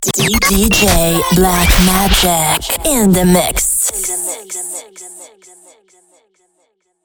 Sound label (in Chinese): 女声干声